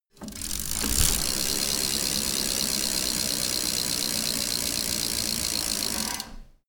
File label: bicycle spinning wheel